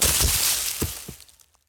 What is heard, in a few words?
grass fall; firefight